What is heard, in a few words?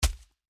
Walls; Blind Quest - The Frost Demon